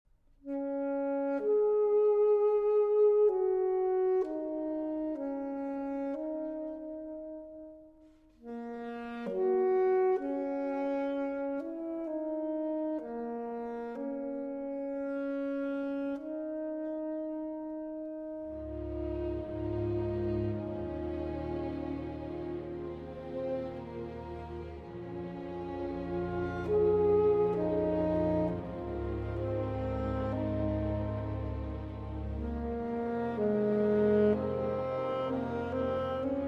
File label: Viola Concertino in D-Flat Major, for Saxophone & String Orchestra; Adagio; Op. 108